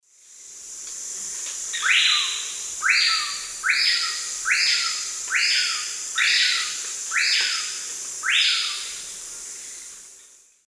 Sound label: Lipaugus vociferans, call; S. America; Eastern Lowlands